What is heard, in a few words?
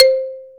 XYLO C 2; AKAI S-Series CD-ROM Sound Library VOL-7